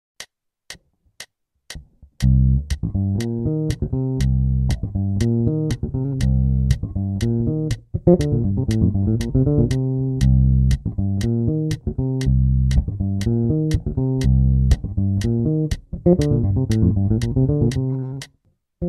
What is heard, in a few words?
Cm7 fill lick